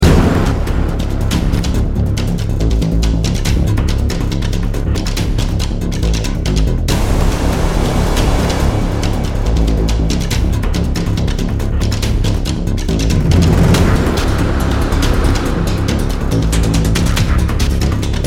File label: dark loop; gamerip